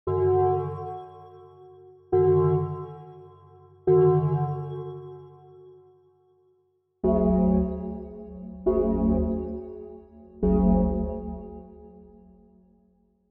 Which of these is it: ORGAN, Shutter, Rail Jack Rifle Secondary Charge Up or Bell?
Bell